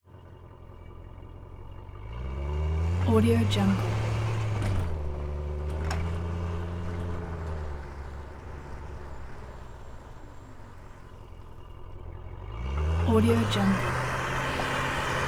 chevy pickup truck; 1988; prosoundfx

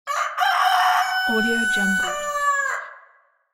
rooster

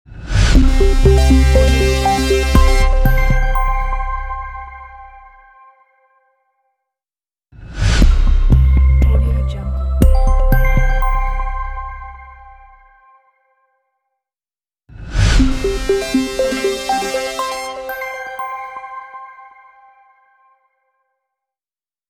corporate technology logo

